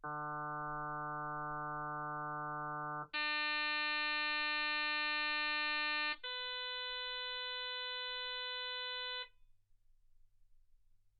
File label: harm sinus 48kHz